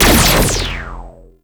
Flux Rifle Shot; Warframe; Laser Rifle; BE Flux Rifle

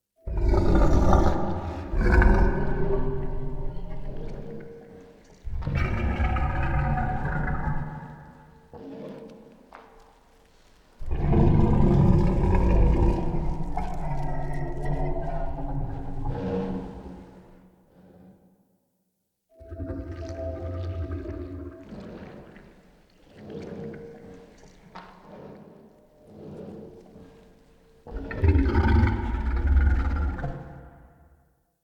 Bromius Vocals; Cinematics; Bromius Quest Wake Up Poppet

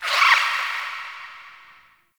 Critter Squeak; Ambience; Warframe; Nokko Colony

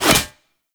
Fishing Spear Line Retract Catch; Warframe; Eidolon Fishing Spears

